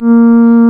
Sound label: REED A#; Big Fish Audio; Synclav FM